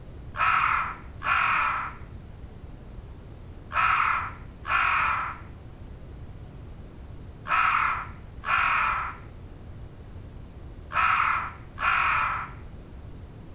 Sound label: Crow Real Ambi